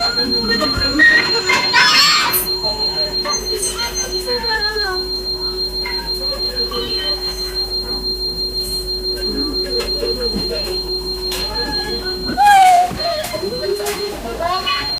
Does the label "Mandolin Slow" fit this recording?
No